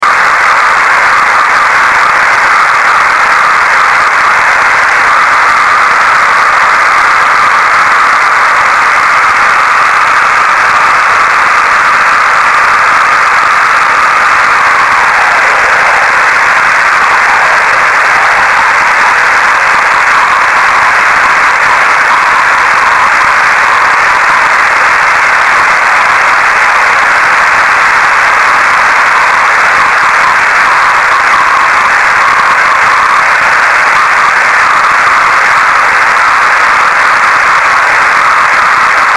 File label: snow